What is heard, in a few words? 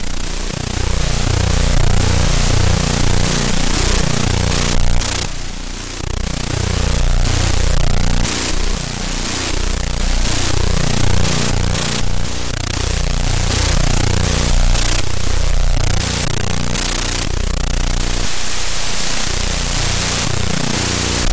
bg noise; Example scripts for the SAU language, and audio files rendered from them using the saugns